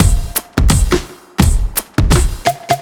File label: Trip Swing Mix, 85bpm; off the grid